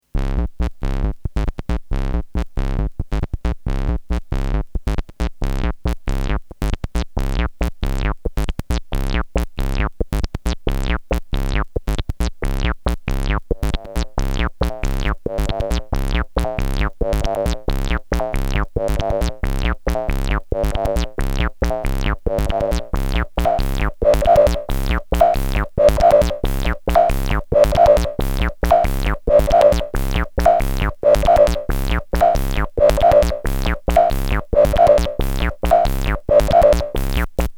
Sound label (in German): nassbass mit bpf saw